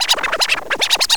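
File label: scratch, record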